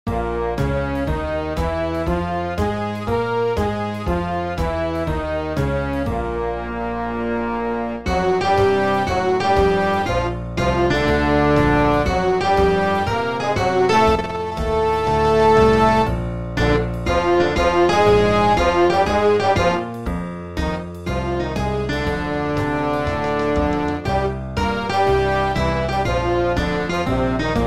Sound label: jazz band auditions